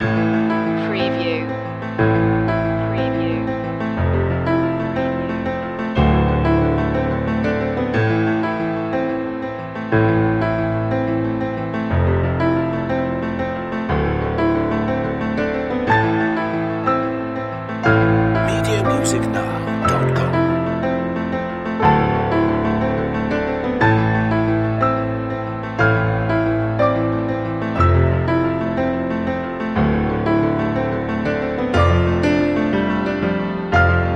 dark moving piano

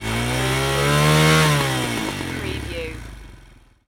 Motorcycle Dirt Bike Rev

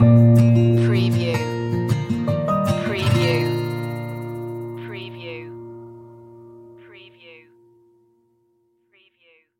folk indie acoustic stinger

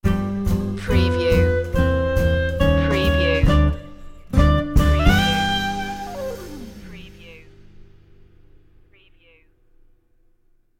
big boy blues sting

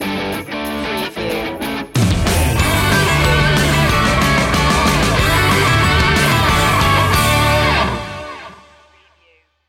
deck the halls green remix sting

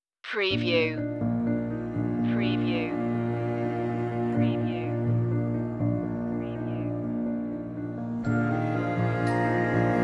breathing

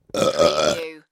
Burp; Human Sounds / Other / Misc Sounds

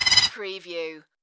Tui Weep

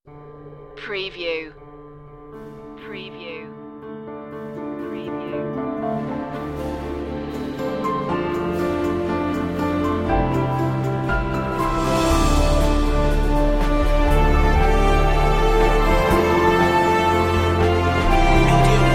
winter glow

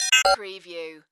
Chime Readout Up

